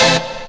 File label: chord A; Techno Trance Essentials; CHORDS